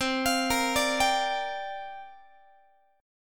C 7th Suspended 2nd Suspended 4th, guitar chord, down; arpeggiated; C7sus2sus4